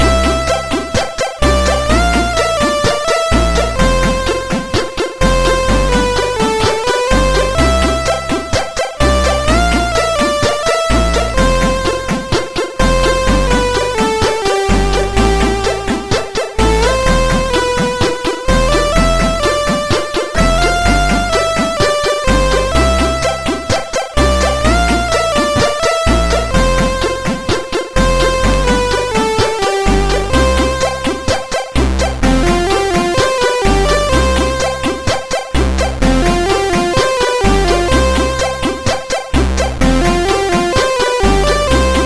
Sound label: Music Samples, sid, MOS6581R2